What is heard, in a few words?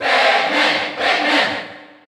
Cheer Dutch; Pac-Man; SSBU